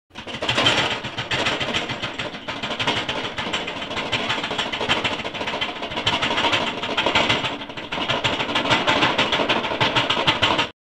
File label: metal shaking